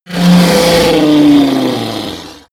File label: dragon roar; kreaturen